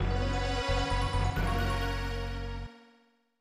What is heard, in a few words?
Semi-Final Battle; Super Shougi 2 1994 SNES